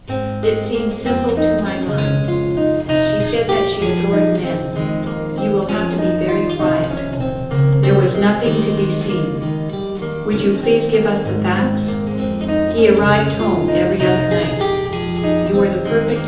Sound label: gitar